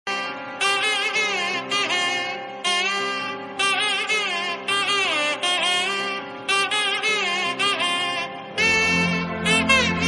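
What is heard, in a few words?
Bhoota Kola background music Ringtone; ringtones; Kantara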